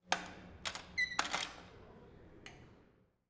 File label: Emergency Door Unlock Maximum Security; emergency effects; AdobeCC